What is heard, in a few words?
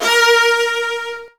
Virtuoso Strings